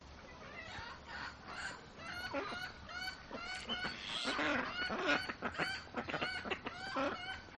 urban farm duck calls

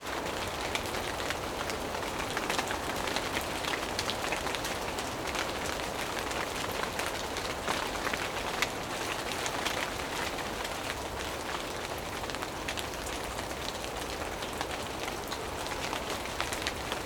nature rain forest on leaves wind in trees

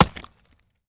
industrial tools axe chop wood; sound effects one

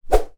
foley stick bendy whoosh air fast; zapsplat

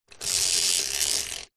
household blind shade pull cord close up; zapsplat

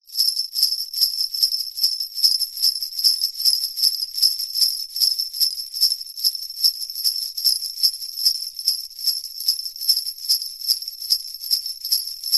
musical sleigh bells med shake

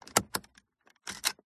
Citroen C2 Seatbelt; MKH8060; pmsfx

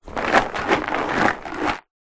sultanas in large plastic tub shake movement

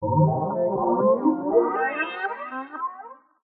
synth space weird sci fi rise accent; Jessey Drake

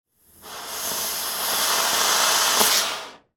firework faulty fizzle then goes out; sound effects one

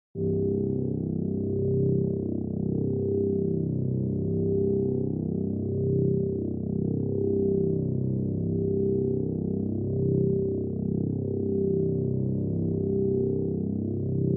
Drone Low Synth Bass Pad Channeling

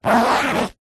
foley luggage bag fabric small zipper short; zapsplat

